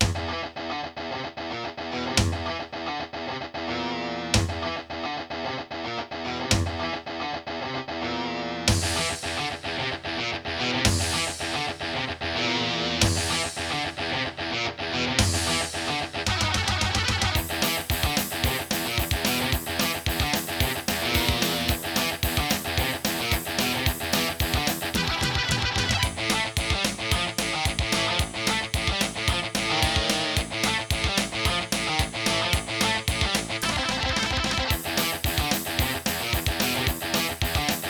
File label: AWE64 Legacy